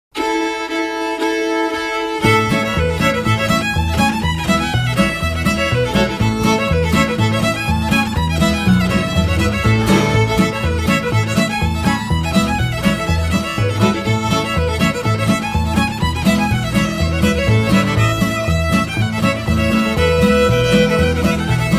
reel (instrumental)

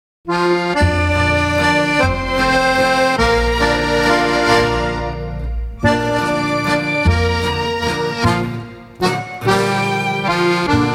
waltz instrumental

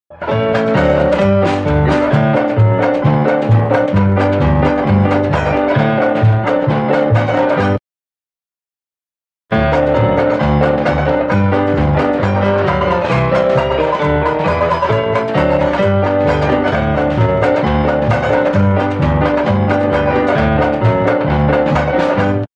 phrase craze v.1 (instrumental)

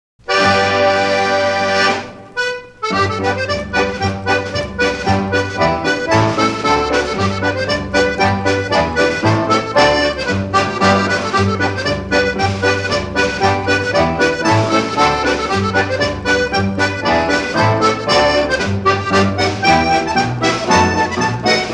instrumental

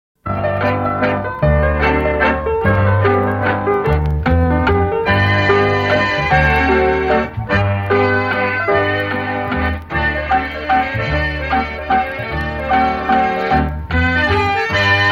bingo waltz (instrumental)

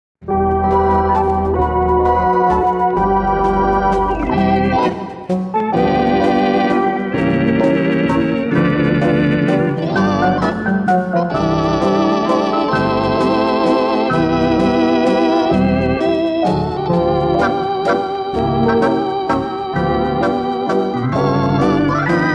waltz, instrumental

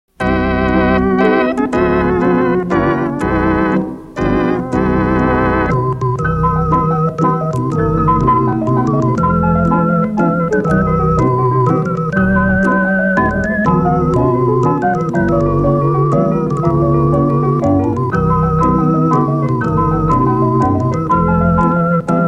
waltz mixer instrumental

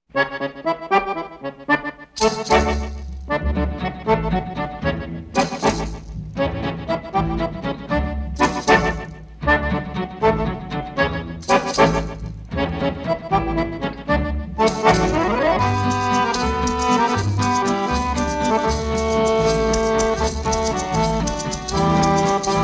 instrumental

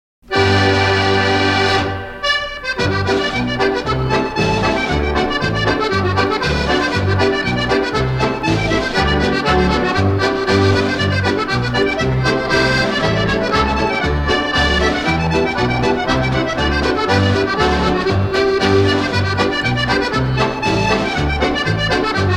instrumental

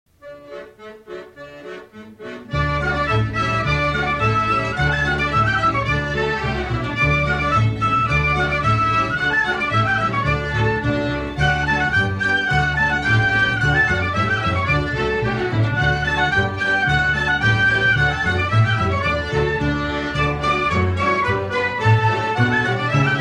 allemand swiss (instrumental)

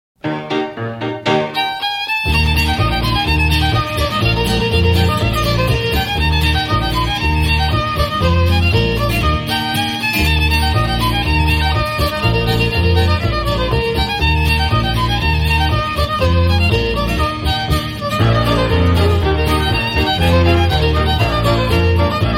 hornpipe, instrumental

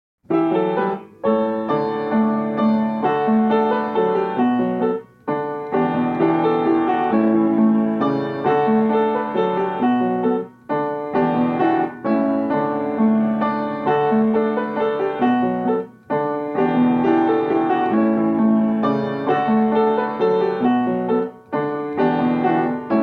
waterfall waltz instrumental

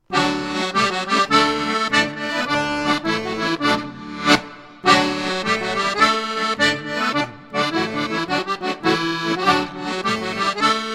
instrumental

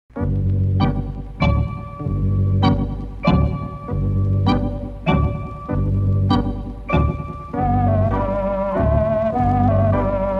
minuet instrumental

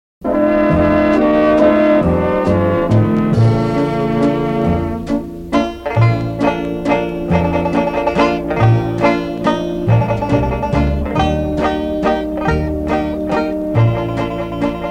double weave waltz mixer (instrumental)

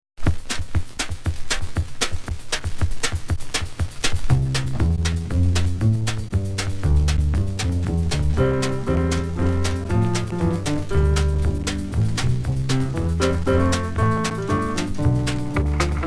instrumental

